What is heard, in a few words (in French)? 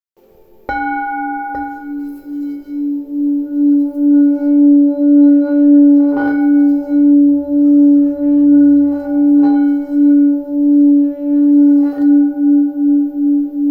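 grand bol, Bol tibétain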